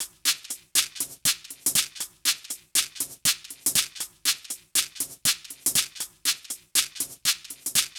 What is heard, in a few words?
Hh Sh Loop 120; Hh-Shake Loops 120; Equipped Music; Loungin House